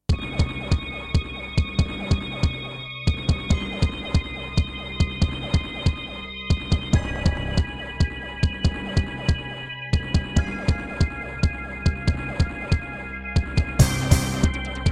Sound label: Pine PT-202 Vivaldi Wavetable DB